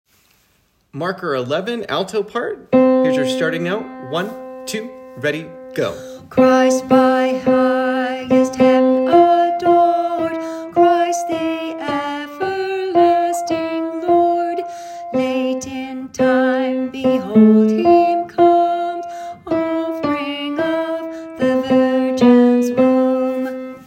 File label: Alto, choir